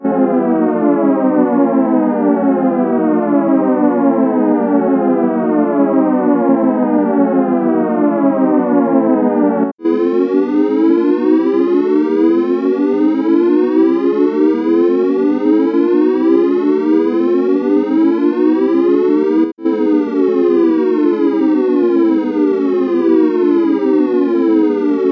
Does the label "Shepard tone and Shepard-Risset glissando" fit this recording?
Yes